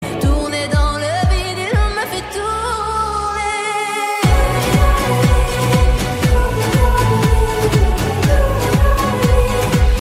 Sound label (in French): danse french ringtone